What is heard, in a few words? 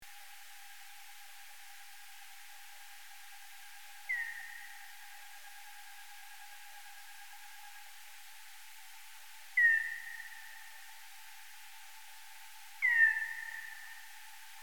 Saccolaimus flaviventris